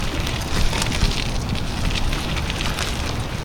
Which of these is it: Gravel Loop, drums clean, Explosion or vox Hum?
Gravel Loop